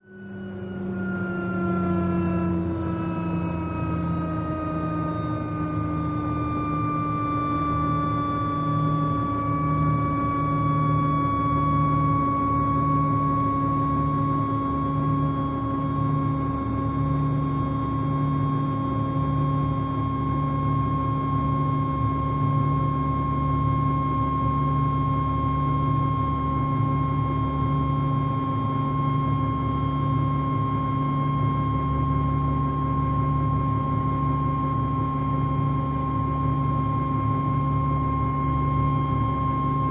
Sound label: OH6 Loach Interior, little bird